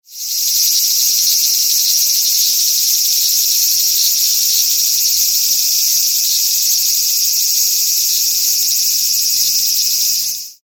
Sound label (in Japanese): アブラゼミ